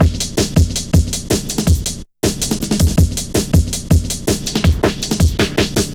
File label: Drum Stepz, Drum Loops 161.5